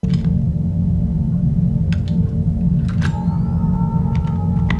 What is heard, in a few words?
Load Phase Pistol; Trek